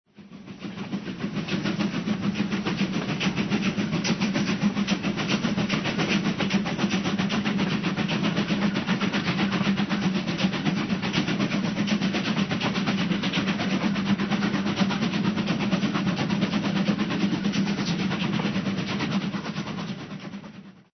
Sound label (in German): Bahn